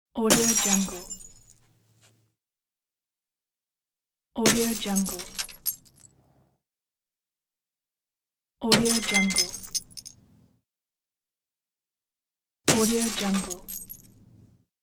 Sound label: glass break